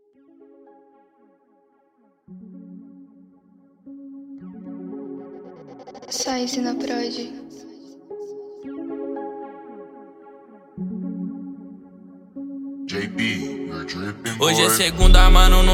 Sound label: music